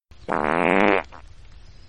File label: hippo fart